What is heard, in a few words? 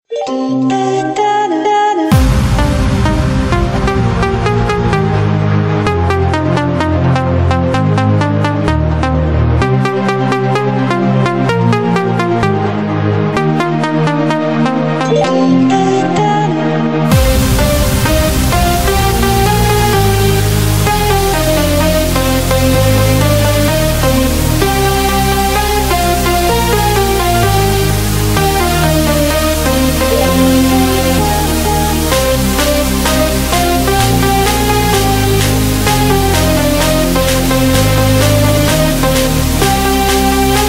french train remix sncf